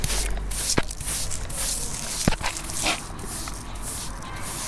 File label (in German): Kehrbesen nachgestellt